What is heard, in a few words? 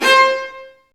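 Marcato, Strings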